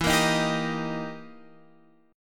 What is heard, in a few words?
E Minor Major 7th Flat 5th, E, down, Guitar, Open F; strummed; JGuitar